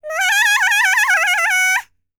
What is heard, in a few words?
BLACK SEA, World Voices; ILIO; Vocal Planet VOL-5